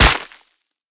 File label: concrete impact bullet